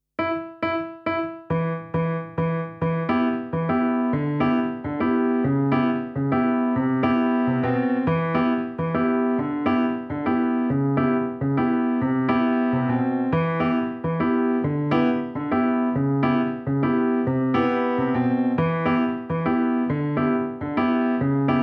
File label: SOLO PIANO; ADIOSES SHOW CHOIR; Navidad 24; CAROL OF THE BELLS